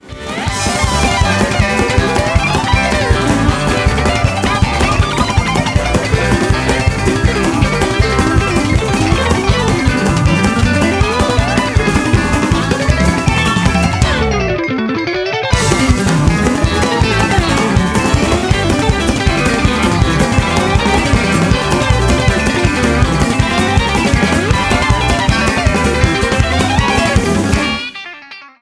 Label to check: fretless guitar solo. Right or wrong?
Right